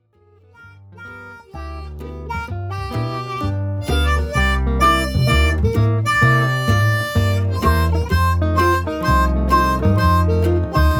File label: jazz band ball